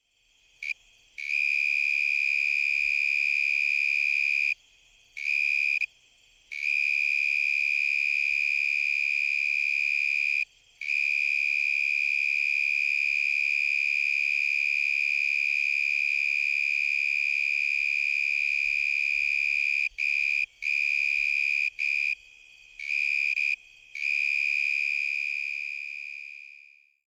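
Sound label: davis tree cricket, Northern Illinois Insect Calls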